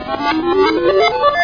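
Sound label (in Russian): электронный звук начинающейся игры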